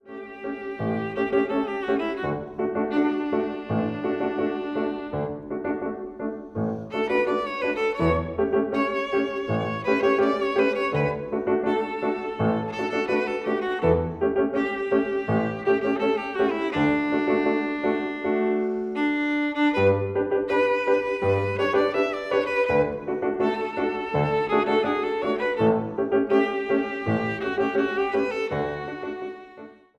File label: VIOLIN; Trinity College London violin exams for 2016-2019; Tchaikovsky: Neapolitan Song from Swan Lake